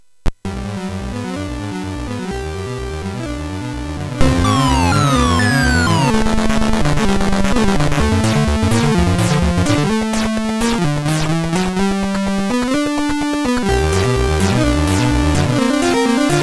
sid MOS6581R3

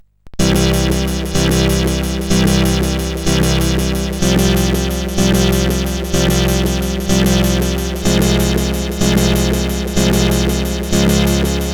Good Blark, CSG8580R5

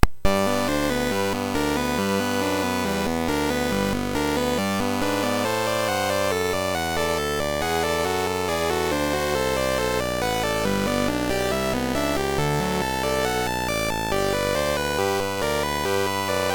MOS6581R3, GAMES; Slinky

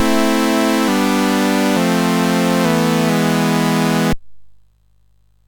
MOS6581R3, GAMES; Waterline

